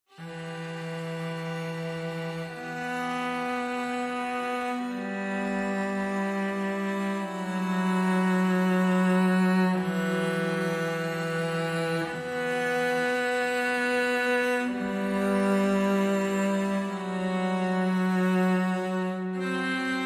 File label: Strings Only